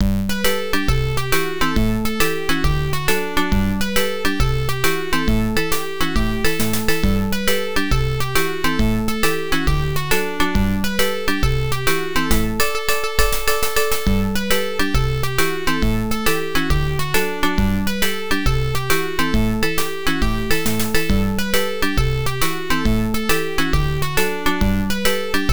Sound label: Level Start; Titus the Fox; To Marrakech and Back